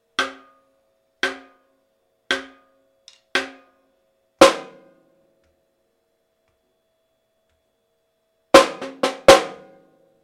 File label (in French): batucada caixas caixa 60 bpm coup par coup